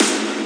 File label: melbourne bounce snare big; STYLE KITS (Hip Hop, Etc); D3ron Melbourne Bounce Kit